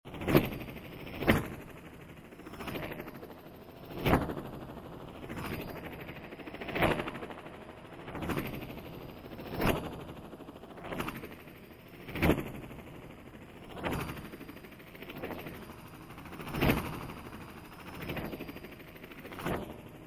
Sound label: Helicopter Flyby (speakers)